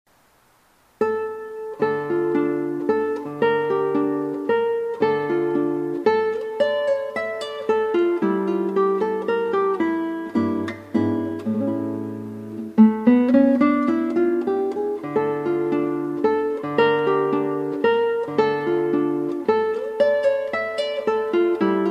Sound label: Etude d minor